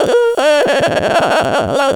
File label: anger strong; VIVAE